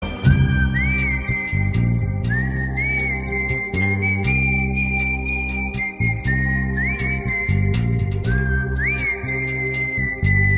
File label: nice tone